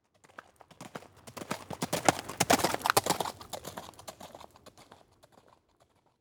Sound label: Animal Horse Trot By On Gravel; animals; AdobeCC